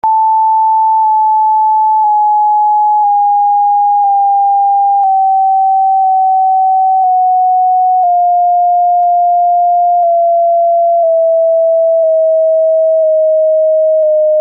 Soprani soli range 3 mixed descendant scales 157+138+126 cents